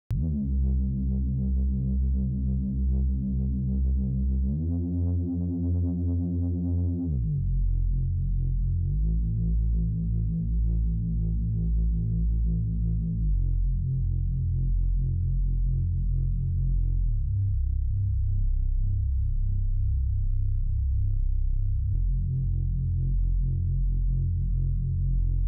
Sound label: No Reverb Sidechain Bass